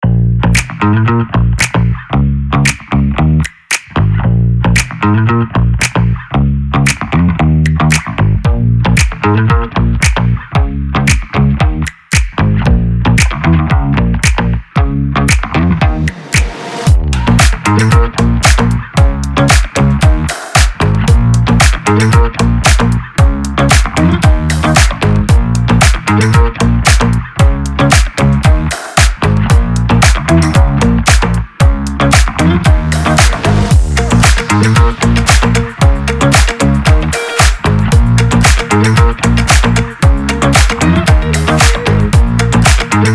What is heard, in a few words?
funk, promo, event